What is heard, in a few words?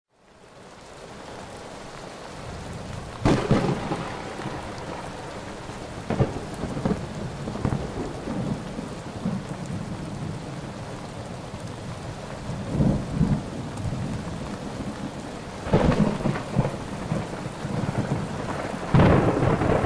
Thunderstorm and Rain